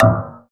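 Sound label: PER PROCU, Procussion, percussion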